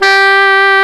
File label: SAX G3